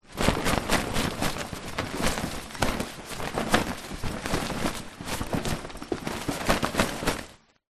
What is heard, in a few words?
foley tent small shake flap around various movements; zapsplat